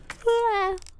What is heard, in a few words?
Yawn